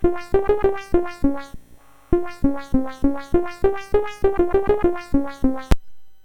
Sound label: Japanese Koto, MOS6581R4